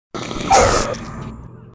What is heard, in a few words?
zombie defeated sfx; zombie pack sfx